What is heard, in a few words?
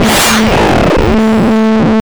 ufo